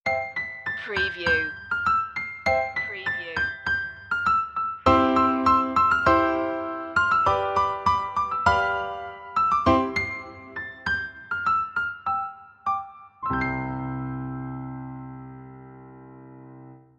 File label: piano; joy to the world; media music now